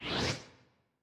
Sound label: Flying Move